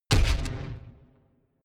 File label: flutter echo; whooshes rises; hits impacts